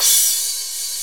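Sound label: reverse crash; AMG; Now CD-ROM (Roland); NOW! FX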